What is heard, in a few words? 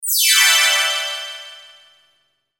Pixie Dust Descend Twinkle Thick; Magic; Blastwave